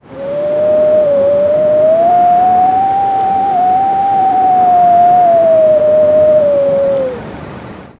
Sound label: chilling distant wind in, mountains